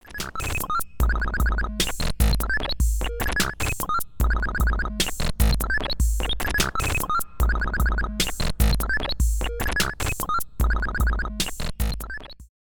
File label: Rossum EM Control Forge WT OSC Glitch